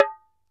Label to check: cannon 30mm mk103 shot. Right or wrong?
Wrong